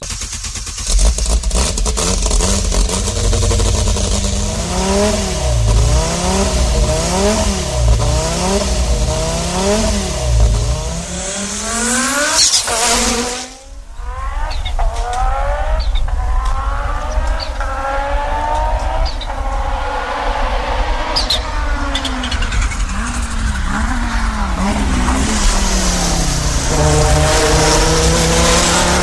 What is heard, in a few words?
engine turbo